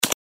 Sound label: Uzi